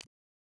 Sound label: Character Land